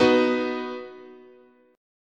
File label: B Major, chord, guitar